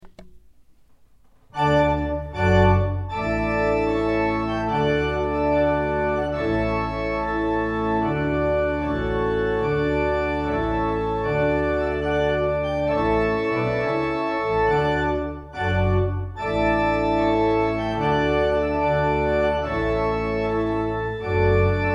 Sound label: Trumpet Tune; weddings music; Purcell